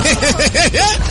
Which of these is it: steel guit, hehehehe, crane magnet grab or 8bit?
hehehehe